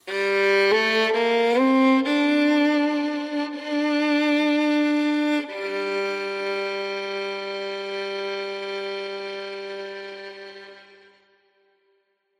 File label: Violin Documentary Drama